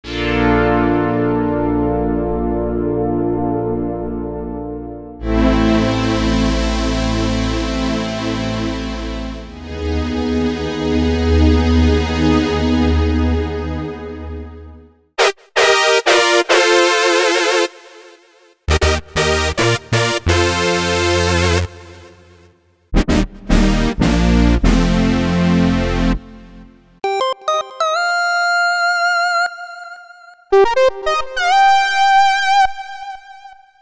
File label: Super Synth